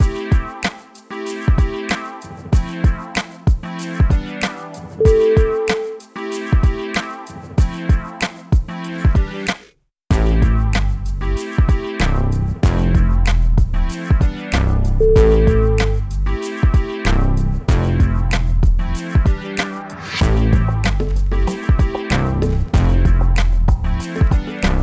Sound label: bold and gritty